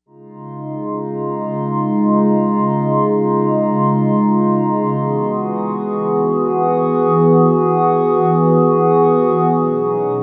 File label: Bad Pad, Novation UltraNova; Factory Patches